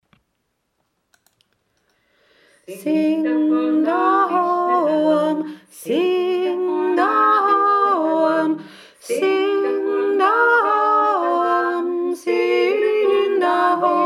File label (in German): siing dahoam 3 stimmig